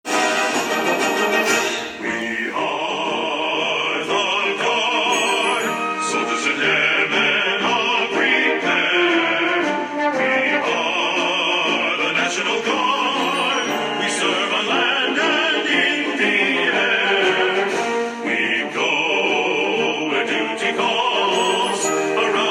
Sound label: national guard hymn